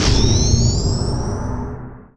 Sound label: warp beam